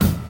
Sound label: studio kick drum single shot e key; kick drum samples